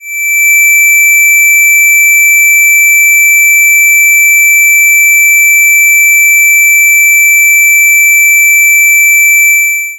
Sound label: 2328hz square